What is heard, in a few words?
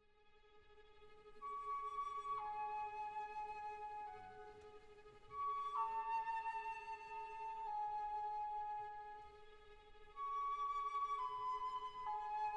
flute largo